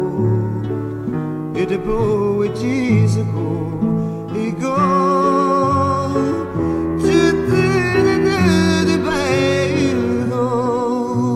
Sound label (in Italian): Provino in inglese maccheronico